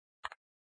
lichtschalter-sound; The Dream Machine

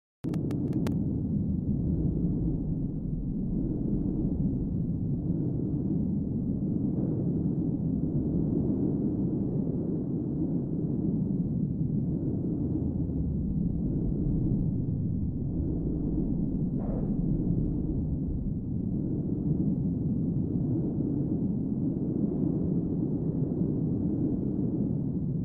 hurrikan; harvest moon

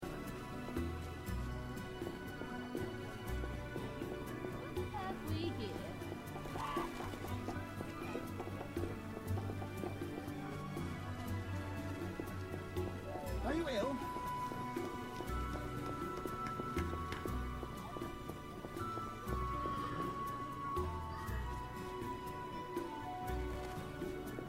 raum stadt; The Witcher

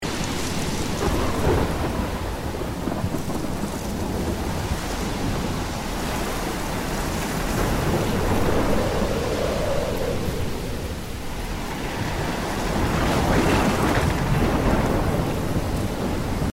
sturm hintergrund; life is strange

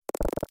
samen einpflanzen; harvest moon

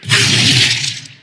kard gewaehlt; hearthstone

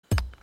spitzhacke; Stardew Valley

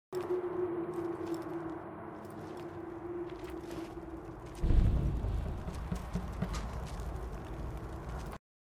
neues gebiet entdeckt; borderlands 2

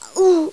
sterben; Tomb Raider PS1; lara

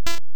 skiing sprung

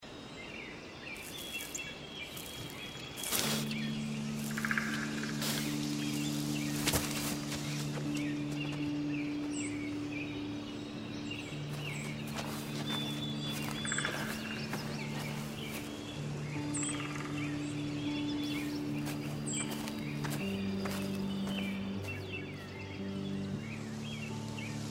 leuchturm hintergrund; Life is Strange; gamesoundopedia

